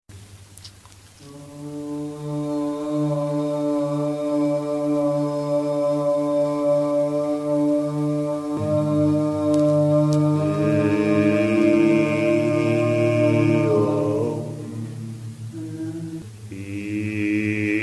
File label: chant; turkiye; на выходе из долины Роз, мы нашли пещерную часовню